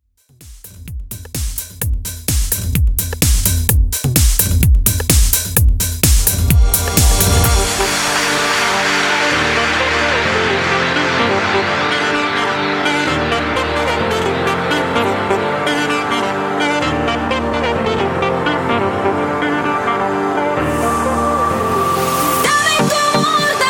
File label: Dance Redrum 128Bpm; remixplanet